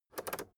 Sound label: rotary control full turn fast